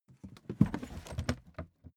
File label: suitcase swing case open